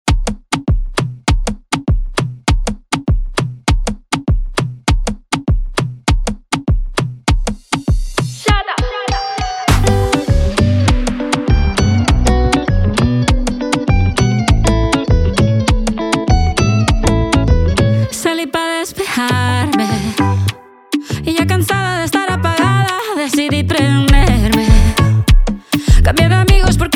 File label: remix bpm 100